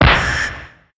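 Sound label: caterpillar damage; monster; Senillinea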